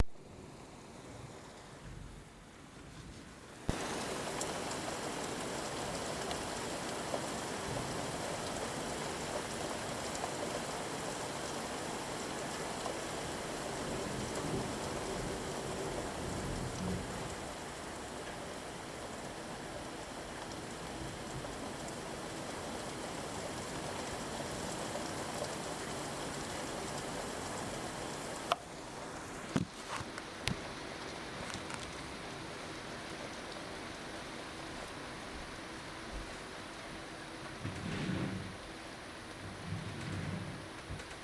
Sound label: early morning thunderstorm